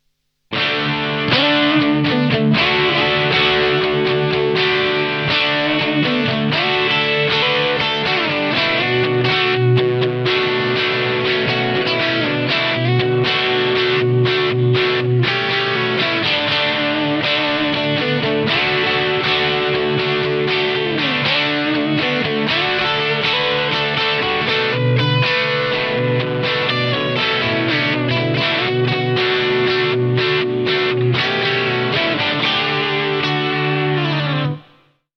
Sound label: Maxon TBO9